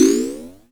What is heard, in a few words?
bouing